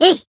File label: Voice attack jp